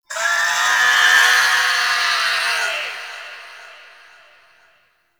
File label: Crystal Spirit Release; Weave Boss